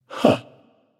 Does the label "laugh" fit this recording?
Yes